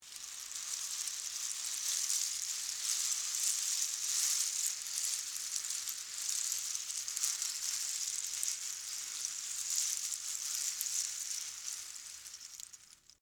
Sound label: Movement Pasta Shells, Texture, Movement, Pasta, Shells; Food (Sound Effects:Household); BlastWave